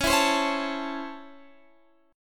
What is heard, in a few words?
C sharp Minor Major 7th Sharp 5th C sharp Guitar Standard; C#mM7#5 strummed; x,16,14,17,14,17; JGuitar